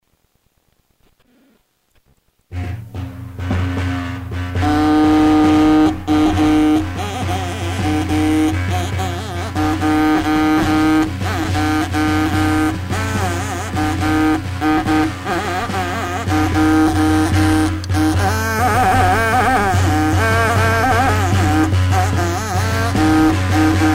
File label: erquencho, ethnic instruments